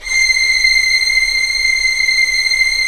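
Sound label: Strings Violins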